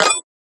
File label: Shop Not Enough; FREE SFX PACK VOL 3